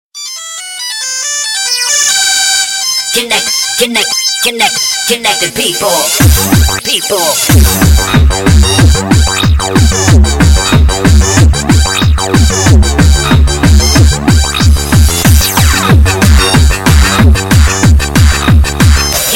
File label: nokia new trance mix